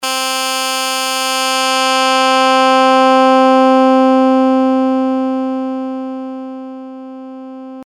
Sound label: puls cutoff bp